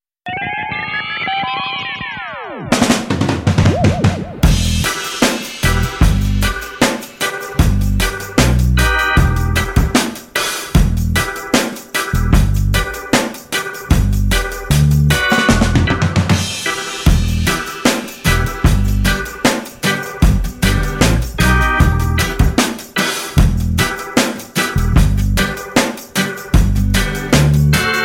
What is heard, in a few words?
best raggaetone